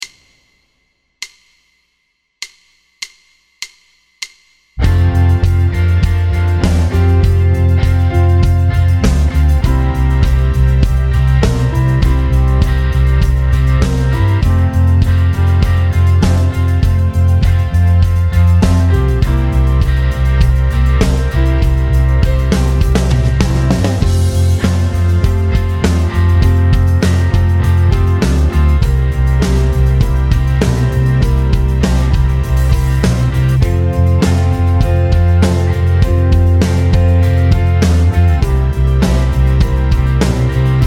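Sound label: One Five One F 100 guitar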